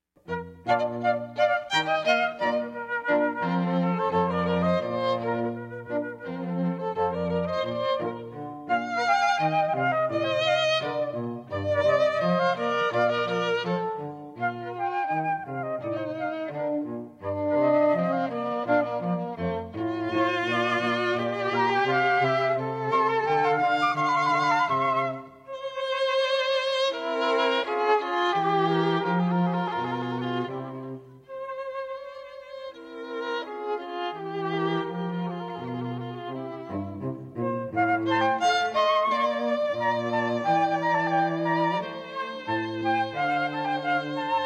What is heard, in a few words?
Flute Trio; Pergolese Sonata 4; Cantilena South Florida